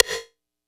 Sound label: kit, Yamaha Motif-ES8